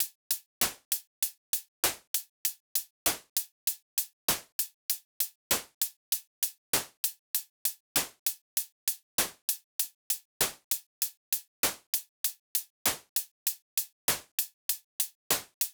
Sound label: Eventide H9000 Sticks Original